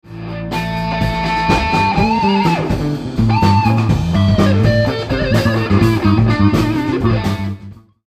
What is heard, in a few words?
more bass